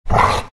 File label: grunt, greevil; courier